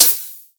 Hihat, Psytrance; One Shots

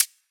HiHat, Psytrance; Drum Shots

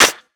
clap; Singomakers; Infected Psytrance; One Shots; Claps Snares

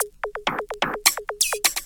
Minimal Fills, Minimal House; Minimal Loops

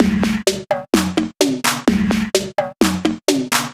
Minimal Beats, Minimal House; Minimal Loops

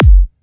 Kick, Psytrance; Drum Shots

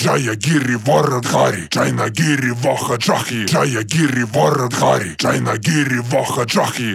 138 Shamanic Voice (Dry), Psytrance

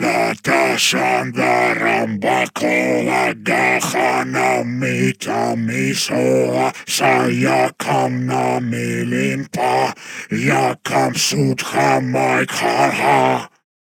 138 Shamanic Voice (Wet FX), Psytrance; Shamanic Voices

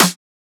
snare; Singomakers Astral Psytrance; One Shots; Snares

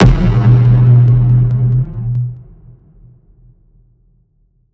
Impact; Singomakers; Infected Psytrance; Impacts

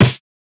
Snare, Psytrance; One Shots; Claps Snares

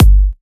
Kick, Psytrance; One Shots

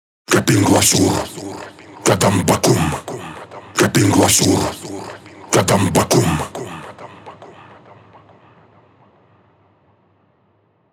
138 Shamanic Voice (Wet), Psytrance

